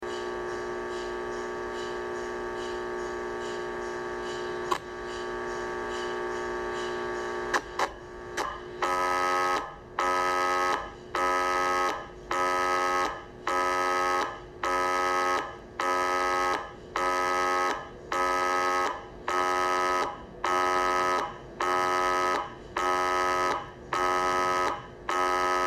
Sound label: MRI sounds realistic